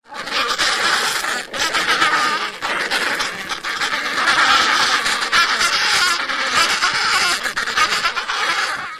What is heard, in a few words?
fiord squabble